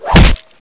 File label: knife stab; weapons; leap knife